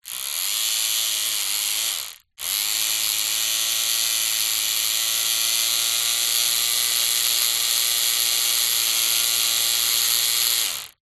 household facial hair trimmer with long hair attachment run short no cutting